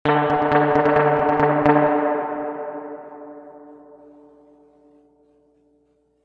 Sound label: reverb tank 3 spring medium staccato